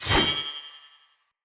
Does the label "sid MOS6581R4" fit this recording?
No